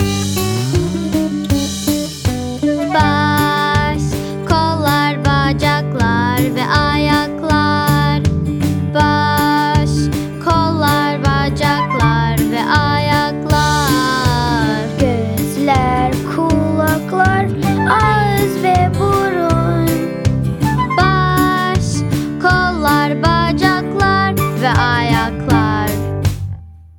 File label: song turkish